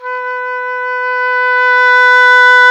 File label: OBOE, Orchestral Winds, Dry; Roland LCDP04; Oboe 4-8